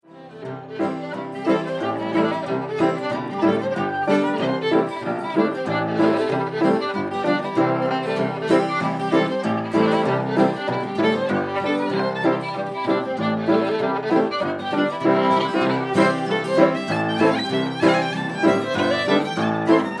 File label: Slip Reel